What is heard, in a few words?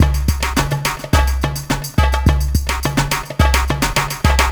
Electronica Drumloop; Drums